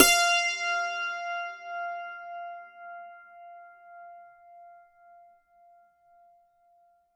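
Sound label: F5, dulcimer beat; SoundBytes; Early Patches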